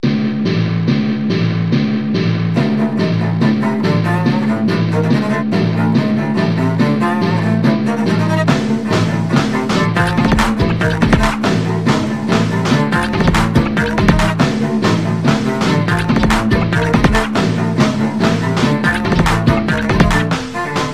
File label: Dirge; Operation Starched Silliness; Deluge Dirge (custom mission); teamfortress